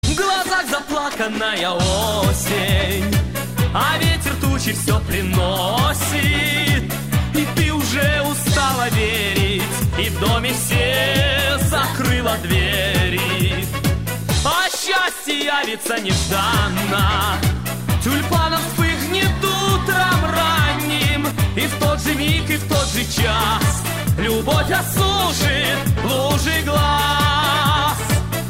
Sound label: samba